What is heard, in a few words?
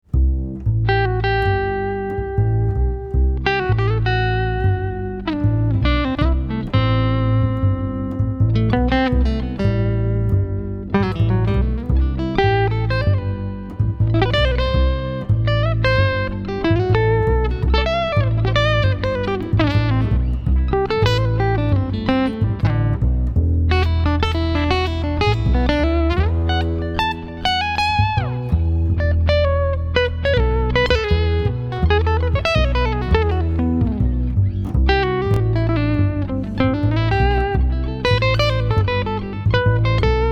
waves